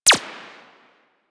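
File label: machine gun tech; Weapons; Archwing Mach Gun B; Warframe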